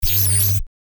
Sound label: Short electrifying outburst electrical noise; sound design effects